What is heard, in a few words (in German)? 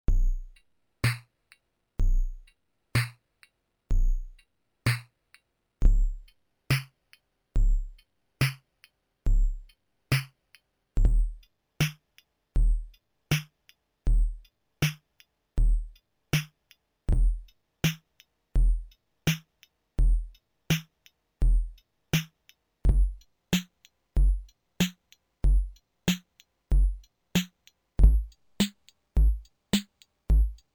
Emax 808 10Khz multitrans vorher nachher